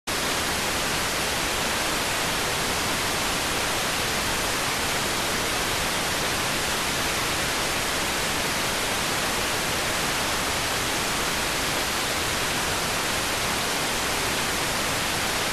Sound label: rtty rx